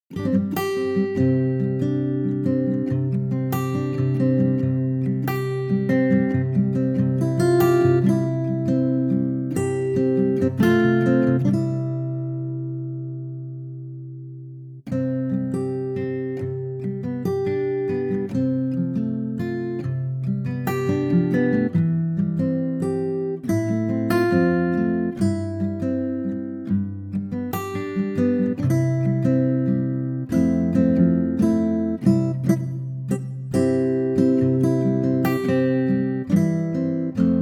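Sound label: C acoustic guitar; key - C - vocal range - G to Bb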